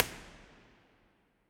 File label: choir impulse response; ely